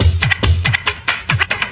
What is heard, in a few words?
Electro Fills